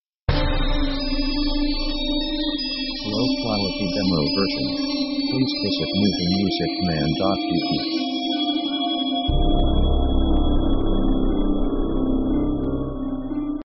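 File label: orchestra spooky siren; Dark mood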